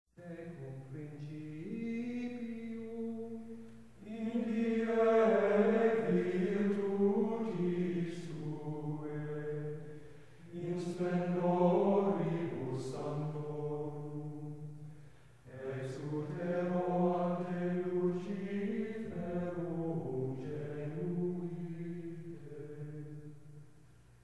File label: office of second vespers antiphon; tecum principium